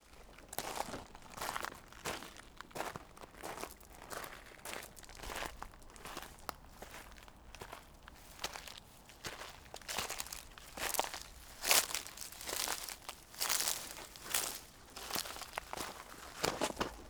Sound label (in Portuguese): Passos no cascalho da Chapada dos Veadeiros captacao proxima; canais mono